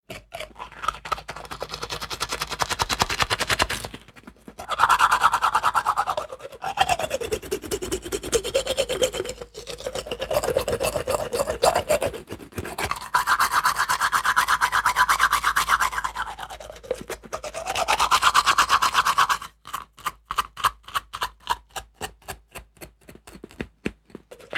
danh rang bang ban chai

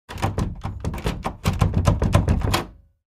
tieng lac tay khoa cua

